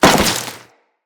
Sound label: Crewman Step Flesh, Footsteps; Enemies; Corpus